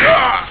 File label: grunt; evander holyfield